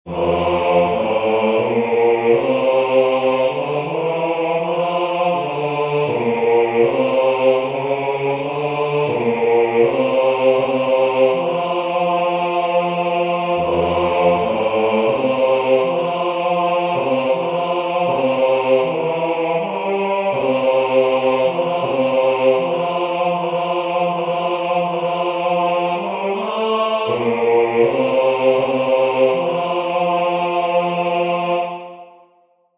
God Save the King, Bass; remembrance day

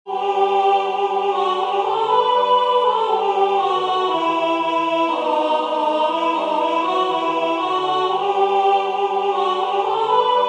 Ukraine National Anthem Alto Emphasised; Ukrainian National Anthem; Toronto Choral Society

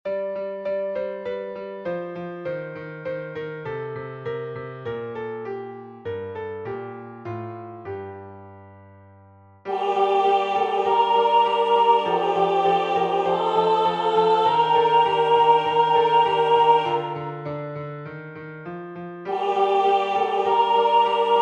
Alto

